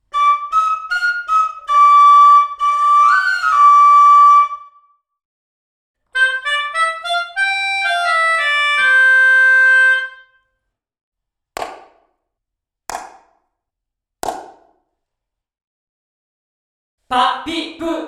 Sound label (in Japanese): ライブ・ルーム：Studio 1, 非常にオープンでライブ感のある響き; Sunset Soundで最も広い部屋。不均等な壁面による複雑な反射があり、非常にオープンでライブ感のある響き。